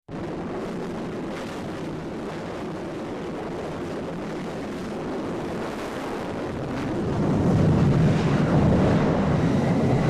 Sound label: Catapult take off of jet fighter from aircraft carrier